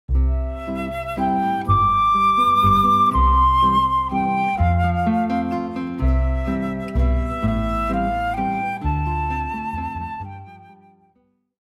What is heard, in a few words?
guitar flute bass trio